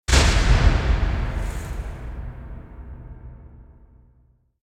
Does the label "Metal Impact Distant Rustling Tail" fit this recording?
Yes